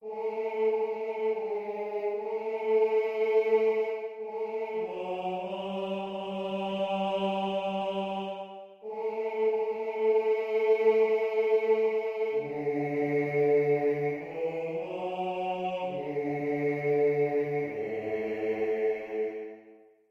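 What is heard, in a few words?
baritone, barbershop tag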